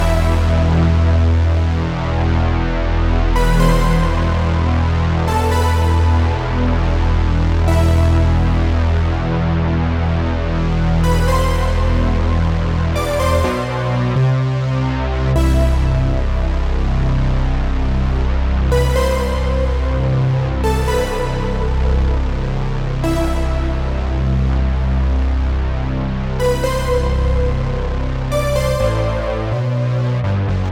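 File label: Fight Break 4x4 D major; Challenger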